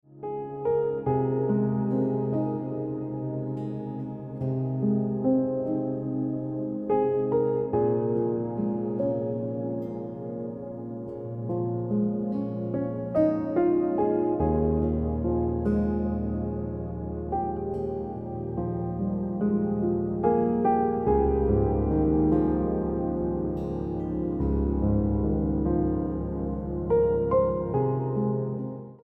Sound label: Piano Music